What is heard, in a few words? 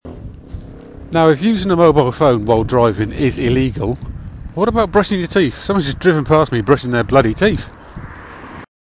brushing teeth and driving